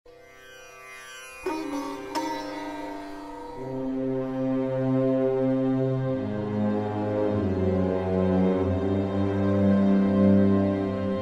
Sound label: Music; Age of Empires III; cinematics